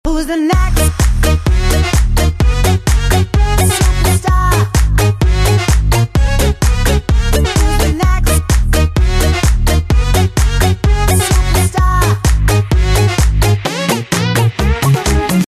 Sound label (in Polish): klubowe zm